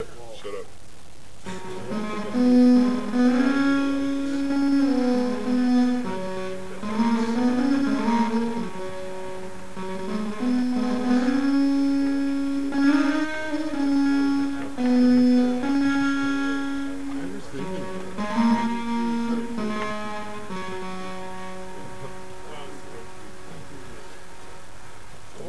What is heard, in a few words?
Reverb